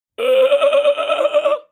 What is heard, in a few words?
idiotic sound